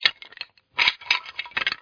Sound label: Skeleton; CastleMiner Z; Xbox 360